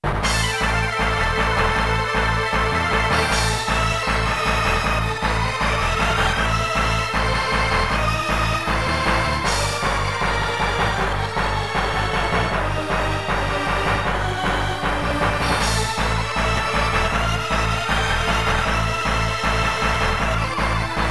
Boss Battle 1 Ogre; Dungeons & Dragons Collection; Saturn

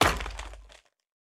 Break Furniture

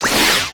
Enemy Active Charge Common Up

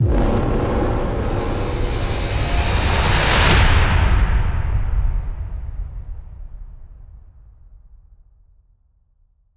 Stinger; extinction deluxe edition; ps4 windows xbox one

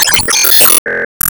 Lightning strike

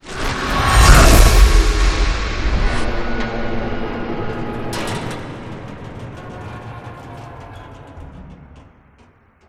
Power Off; SCU Offices

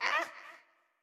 Wimp Hurt; Going Under

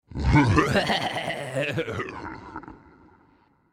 Laugh

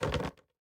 Bollard Lower Stinger

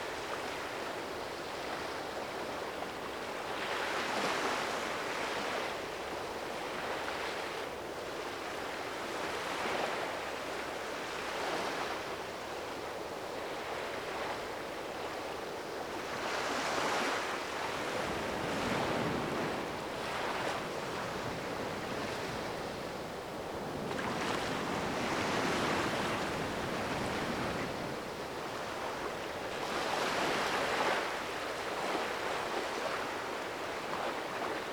Beach Ambience; fastlane bowling

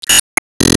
Heal Split; Disney Star Smash; Android, iOS, Mobile

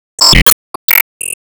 Laystorm laser; disney star smash android ios mobile gamerip 2020

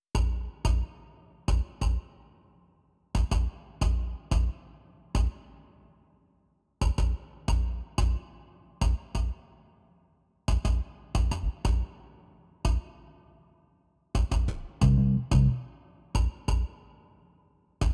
Cherrapunji Bass 90

